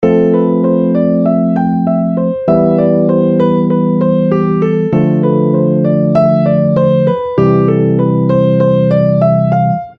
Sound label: thoughtful